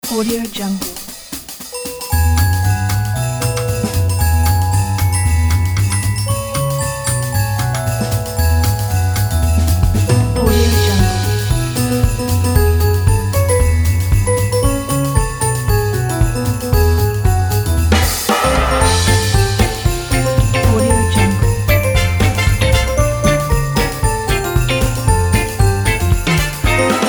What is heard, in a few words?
christmas jazz